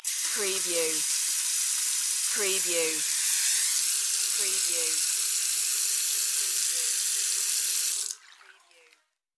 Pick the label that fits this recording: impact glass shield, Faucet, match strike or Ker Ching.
Faucet